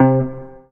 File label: Single Acoustic Basses